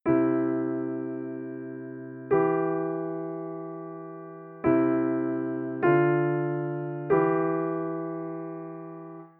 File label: P4 soprano and bass